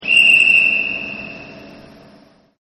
Train Conducter Whistle; Trains; Vehicles

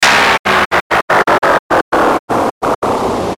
Stutter Hiss Descend

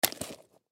Gas Mask Toss Down Hard; Military; Warfare; Blastwave

